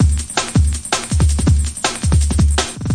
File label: rollin super highs, drum loops and breaks, 164 bpm; 161 to 180 bpm